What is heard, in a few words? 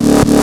Snare FX